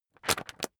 magazine skim bright

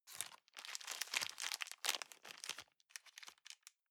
plastic bag small rustle long

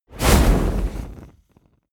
whoosh fire ignite small

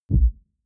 sub bass

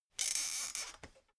folding chair squeak

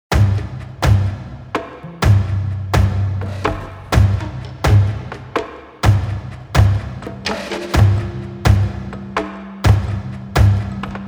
percussion and marimba groove

